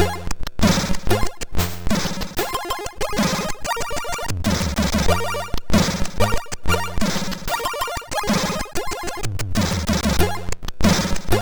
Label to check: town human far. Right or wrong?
Wrong